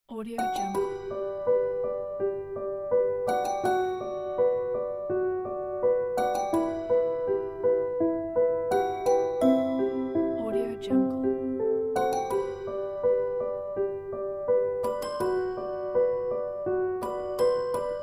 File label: sad piano